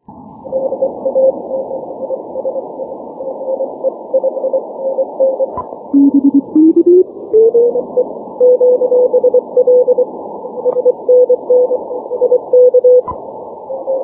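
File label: 12m continuous wave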